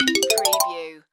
Casino Slot Machine Win

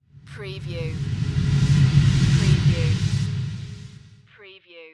Whoosh Dark

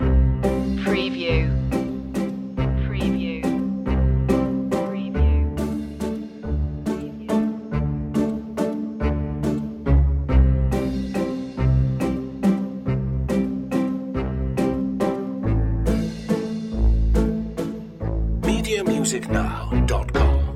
waltz

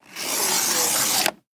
Paper Cutter Blade

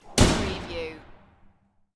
Door Slam Big Reverb

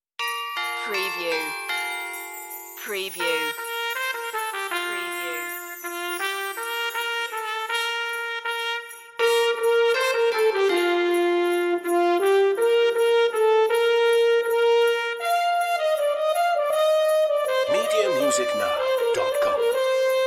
ding dong merrily brass 80

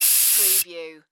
Aerosol Can Spray; sound effects bible

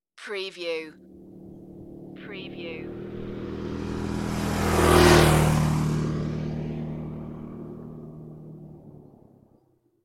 motorbike passing

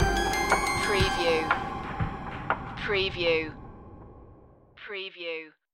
retro video game ferris wheel stinger

